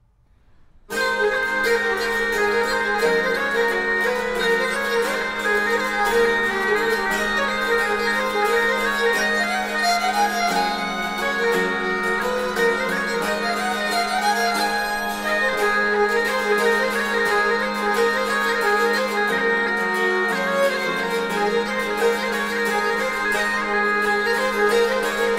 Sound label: Rolling hornpipe